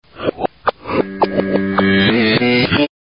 transition; reverse

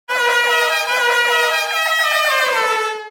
horn; cave

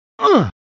ungh

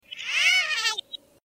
yahaha; reverse

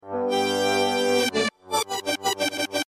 racefanfare, reverse

